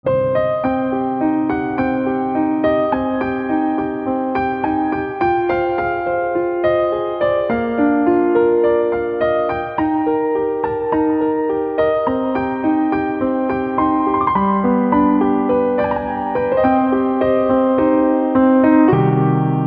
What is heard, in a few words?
Piano